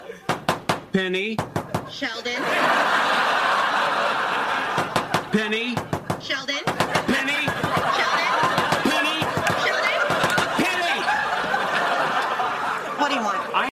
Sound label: knock; penny sheldon